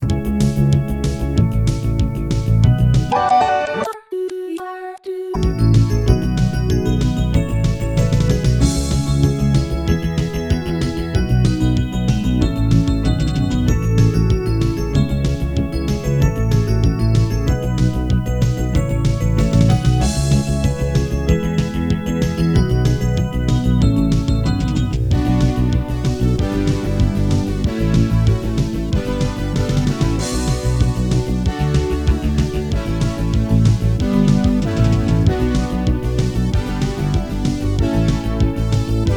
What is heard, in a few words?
Stage Music, midi